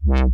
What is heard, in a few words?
Synth Prophet Bass C; Electronisounds